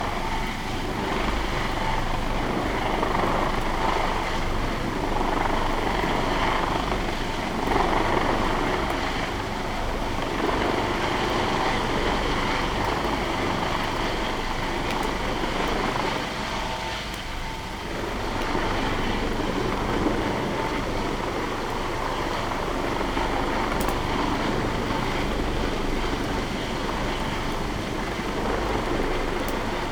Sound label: No Ship, helicopter